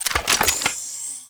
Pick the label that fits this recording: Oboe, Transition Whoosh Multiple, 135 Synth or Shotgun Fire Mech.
Shotgun Fire Mech